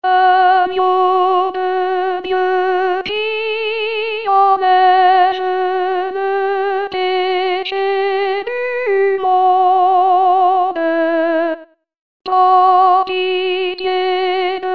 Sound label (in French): Agneau De Dieu, Chorale; 2016 Paques; Mozart